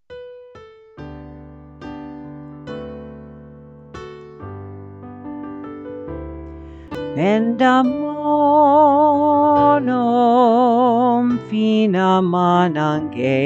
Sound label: piano; Kituba